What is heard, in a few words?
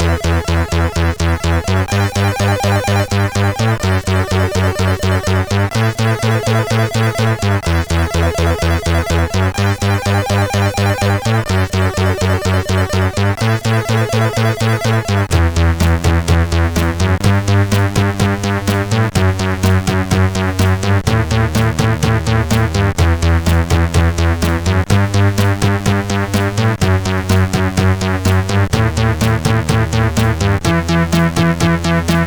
sid CSG8580R5, GAMES; Crystal Cave